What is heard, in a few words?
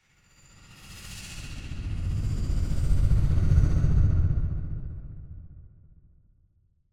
Whoosh Gritty Tone Hoarse Pass - Low; Hollywood Edge; sound designer's toolkit v2